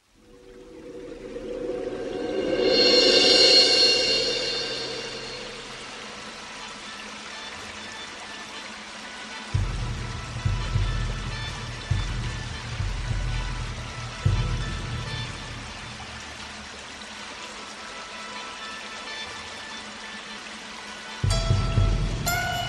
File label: ambient with nature; river of tao